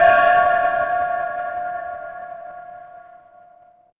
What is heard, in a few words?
instrument singing bowl